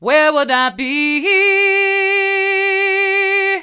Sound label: where would i be hard, vocal